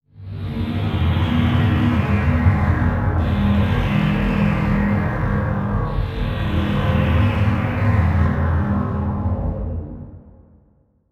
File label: Transition Stab Dark Medium; Production Element; Title Transition; AdobeCC